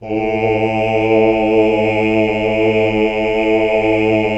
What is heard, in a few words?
Basses